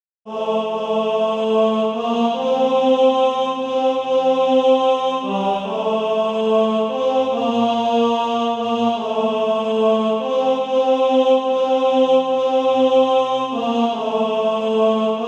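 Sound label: tenor, Practise Music; From Choirs Redeemed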